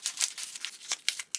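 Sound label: paper crumple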